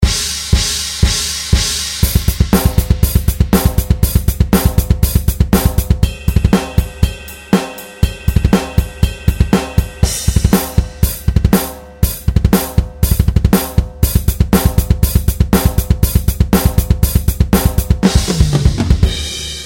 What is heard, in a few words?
Drum Solo